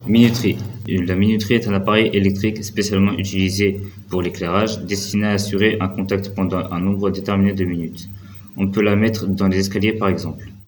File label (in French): minuterie sire sada guiro